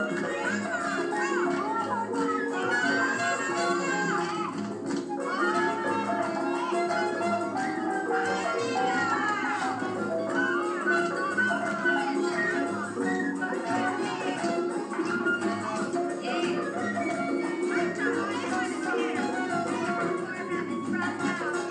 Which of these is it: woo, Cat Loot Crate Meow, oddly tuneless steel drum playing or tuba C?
oddly tuneless steel drum playing